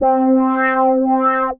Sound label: Meow Attack, Synth Pad; Ableton; Creative Extensions; Poli